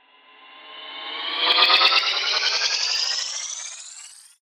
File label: Zippy Speed up